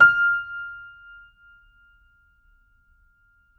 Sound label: JAZZ F5, Keyboards Acoustic; AKAI; USB Soundscan vol.17